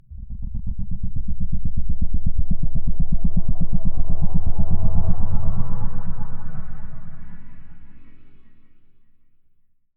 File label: Transition Whoosh Flutter; bottlerocket